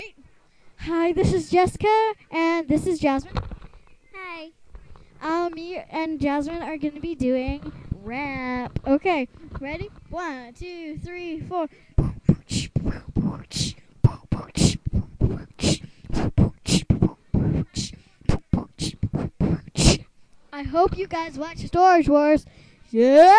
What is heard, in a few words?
rap